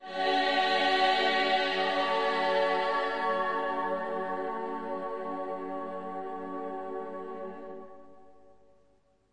Slavic Choir F sharp m1, Slavic Maybe Bulgarian Choir